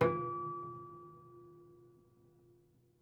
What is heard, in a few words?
Prepared Piano